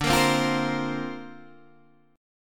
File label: D-Minor 7th Sharp 5th D Guitar DADGAD, chord